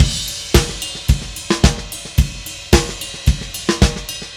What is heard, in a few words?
Drums Crash, Indie Rock; Session 01 - Brighton; Sonic Foundry (Sony Creative Software); Indie Rock Anthems